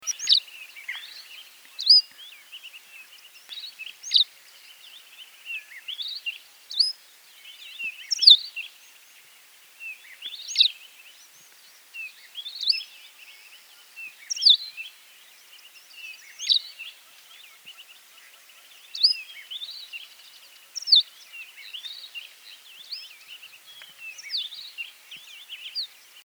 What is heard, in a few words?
Long billed Pipit Anthus similis chyuluensis